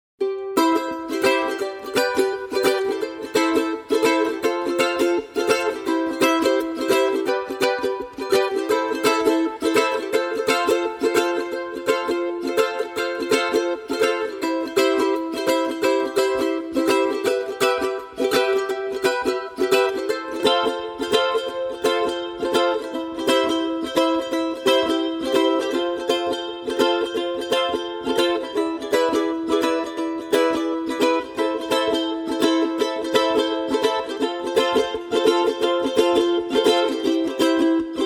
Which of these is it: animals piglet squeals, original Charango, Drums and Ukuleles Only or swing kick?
original Charango